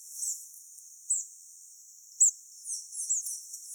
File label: Anthus hodgsoni; Chambal